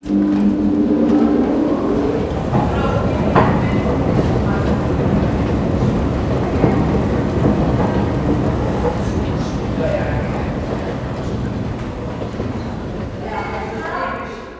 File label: escalator begin